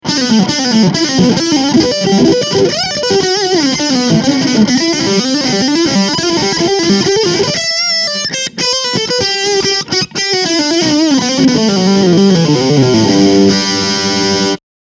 High Gain Sägezahn

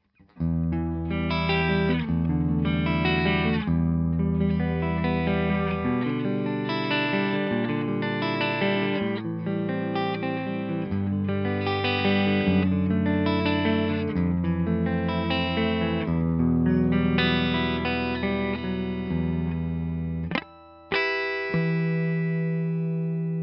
Hals PU Clean

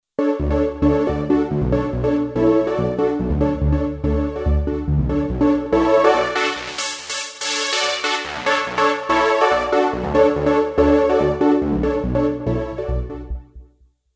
Sequenz SH-201

